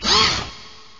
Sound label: jump; alienarena; construct